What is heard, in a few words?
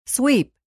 sweep